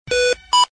Beep boop boop bop

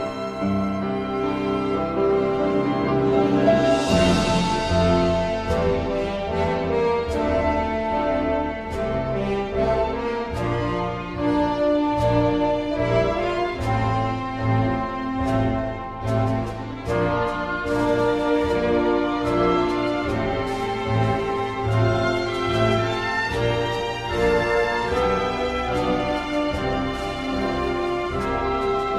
March

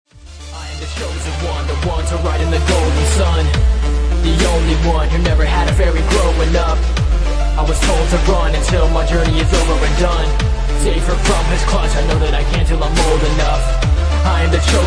dubstep rap vocal remix

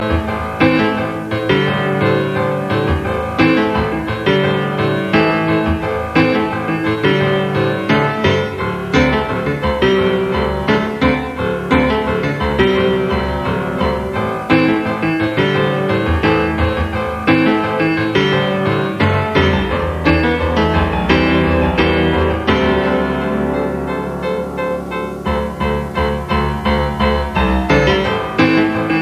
Jazz

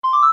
multi tone sms; ringtones

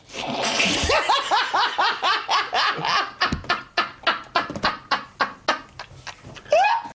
screaming

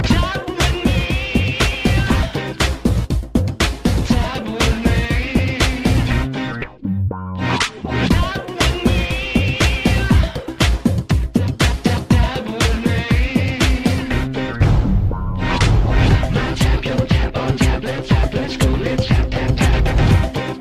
Electronic Tap

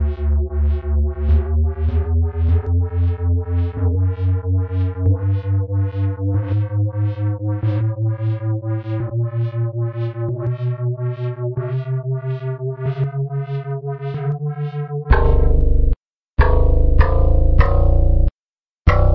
D Chromatic Scale

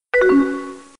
AIM Instant Message

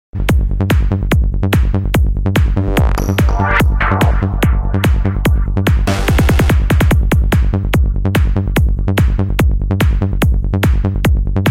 Beat Dreams

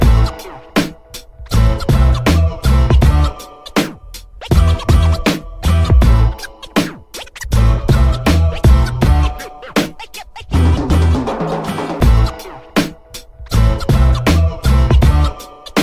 Scratch n Mix

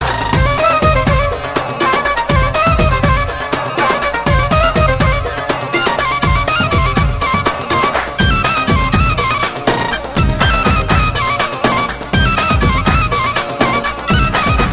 dabka, ringtones; alternative

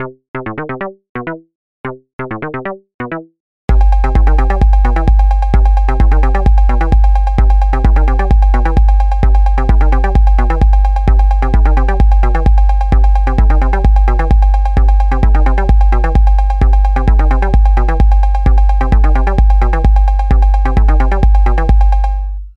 cowbell ringtone

